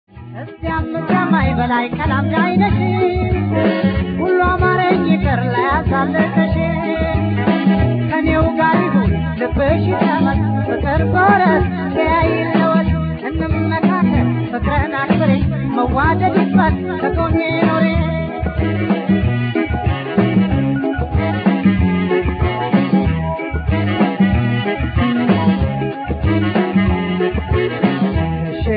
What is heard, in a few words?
tango